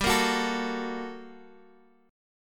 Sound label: G Minor Major 9th G down Guitar Drop D